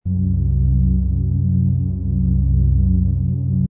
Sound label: Dull; midi